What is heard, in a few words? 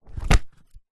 household book large thick set down on top of another; zapsplat